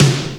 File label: BIG-SNR; Rubber Chicken Software Sample Bank; DRUMS + PERC